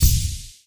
Hero Super Dash Ready

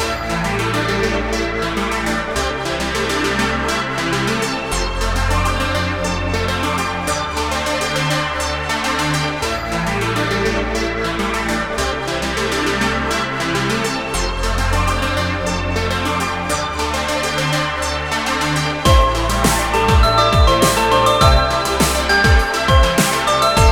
Casual Arcade Track; Crashy Laps

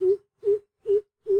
Amb Env Bird-Hoot

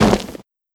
Barbarian Cloth

